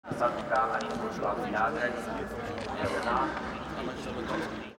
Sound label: train station